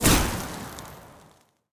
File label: frostbite; Minecraft; Votive World